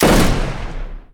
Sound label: Boss Shotgun Sound